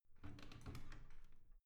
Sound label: washing machine open gentle